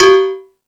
Percussion, Drum Machines E-MU Pro-Cussion

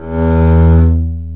STR, Cb Harmonics; Roland L-CD702; Cb Bowed

